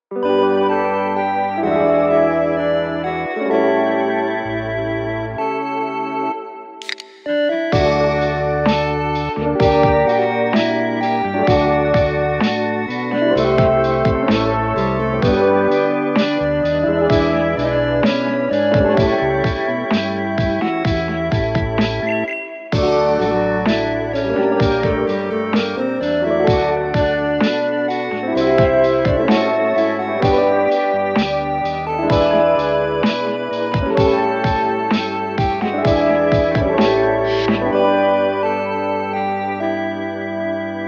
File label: Lo-Fi Soda Pop Beat; New Bark Town; GSC